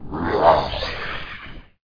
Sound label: zombie